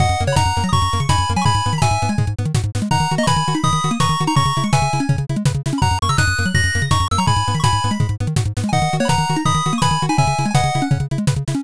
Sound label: bgm drive